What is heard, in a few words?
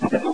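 scuttle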